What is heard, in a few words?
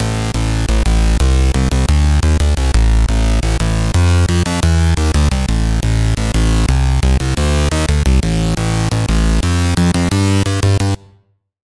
Bass